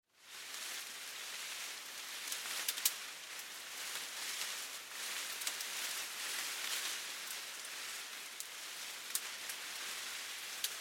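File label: Leaves Medium Leaf Wind Moves Rustles Snaps; Weather; Big Room Sound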